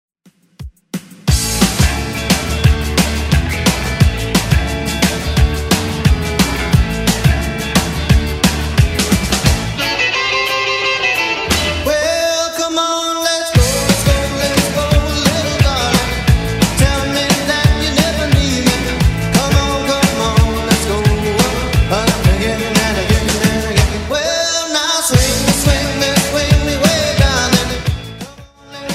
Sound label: 80s Redrum 176bpm